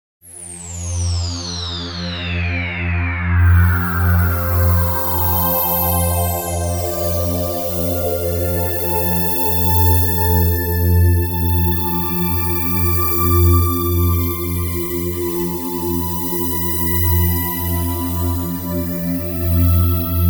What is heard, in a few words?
Modal Improv; over F: Spacy Sound